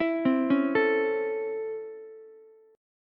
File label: C sharp Minor Major 7th Sharp 5th C sharp Ukulele; C#mM7#5 arpeggiated; JGuitar